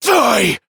Power Cast; Warframe; Entrati Lab Quest; 2023 TennoCon; Arthur

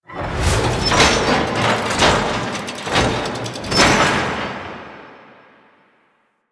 Motorcycle Customize In; Interior; Ambience; Warframe; Nineteen Ninety Nine; Tailor Shop

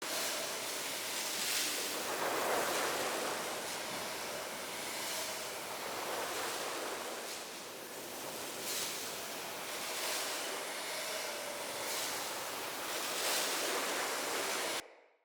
Forcefield Border Loop; Traps

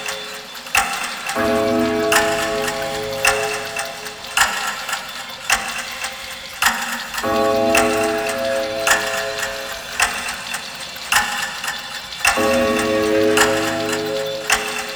Undercroft Void Clock Loop; Ambience; Props; Warframe; Duviri

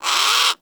Sea Bird Tranq Dart Grunt; Characters; Warframe; Ostron; Hunting Critters

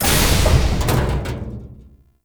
Portal Entrance Door Open; Octopede; Warframe; Duviri Murmur; Ambience

